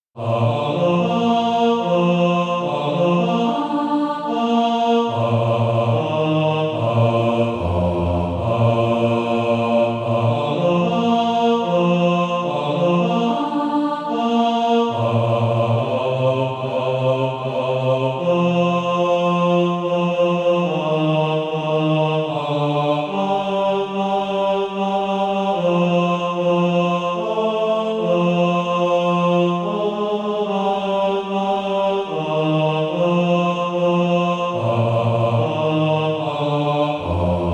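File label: bass, Practise Music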